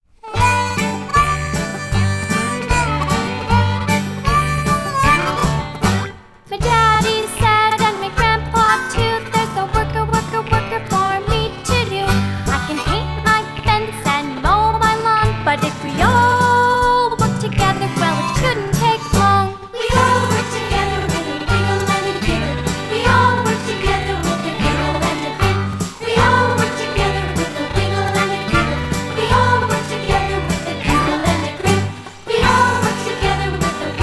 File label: Slow Vocals